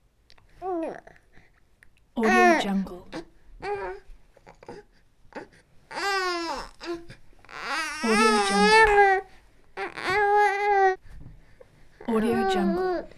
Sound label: newborn baby